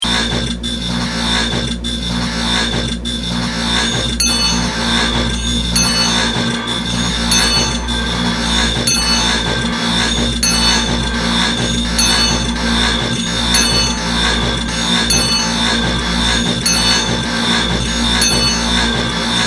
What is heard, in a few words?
sound produced by hydraulics